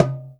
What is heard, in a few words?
GENDANG E5, GENDANG DRUM; Spectrasonics; Heart of Asia